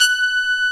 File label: SYMPHONIC BRS